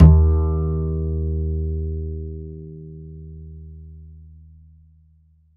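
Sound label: double bass D#2, Jazzistic; Univers Sons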